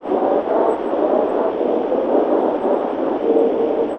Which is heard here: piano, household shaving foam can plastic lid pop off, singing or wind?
wind